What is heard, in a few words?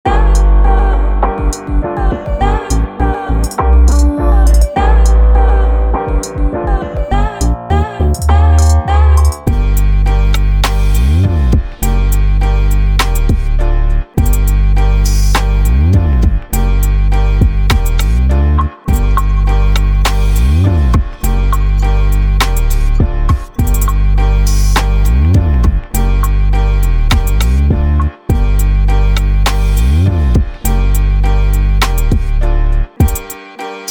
Hip Hop